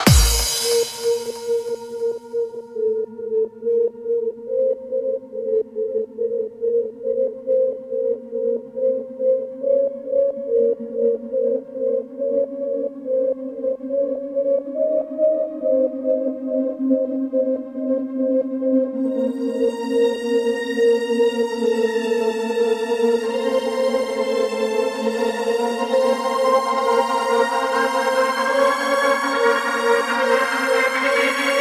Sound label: adagio for strings